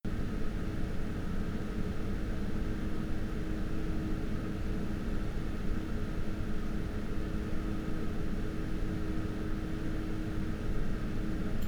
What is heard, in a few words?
Noctua 120 Max RPM